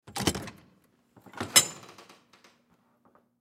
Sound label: Door Open, Door Open Push Bar Handle Interior; Miscellaneous Doors; Office; Blastwave